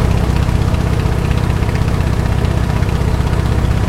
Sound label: wildcat cockpit, engines; War Thunder